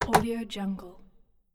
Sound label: old schooner boat bridge control switch; stormwaveaudio